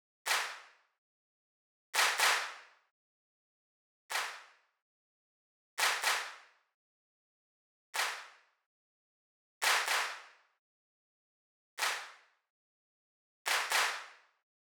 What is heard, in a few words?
hands clap